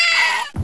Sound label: dead dog